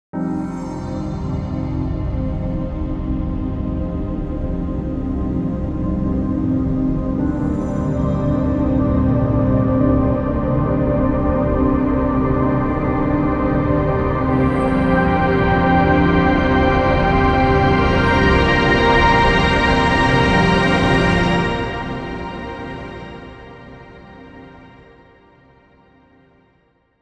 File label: TEXTURE Minimal tonal